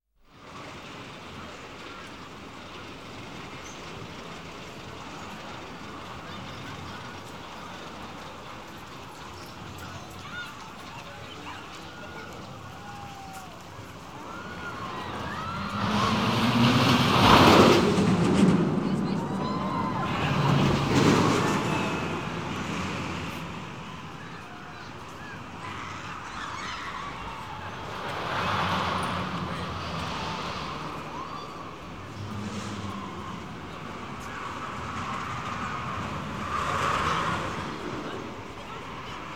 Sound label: Rollercoaster General Atmosphere; KMP Film Video Sound Effects